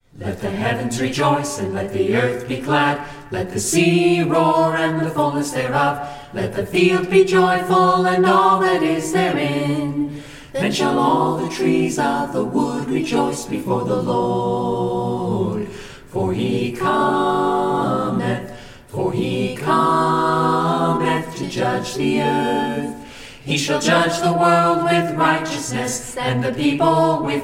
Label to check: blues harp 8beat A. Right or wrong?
Wrong